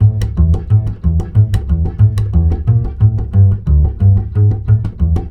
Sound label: BASS, Balkanish Traditions